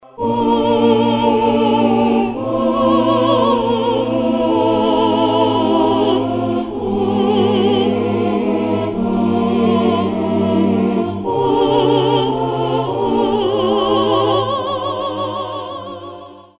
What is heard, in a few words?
Choirs; triton extreme